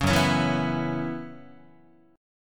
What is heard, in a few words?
F sharp 7th Suspended 4th, Guitar; strummed; F#7sus4 Chord